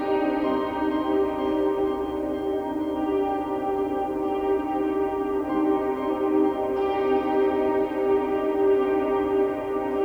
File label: Piano Reverb; BB 2HTC Samples Vol 4; Pads